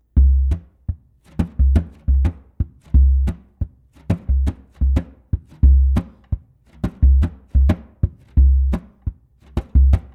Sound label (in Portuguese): TAMBOR SURDO Juntos